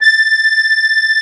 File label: HOHNER, akkordeon